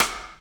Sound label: Rimshot, Snares